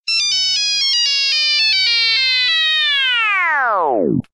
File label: Nokia Pitch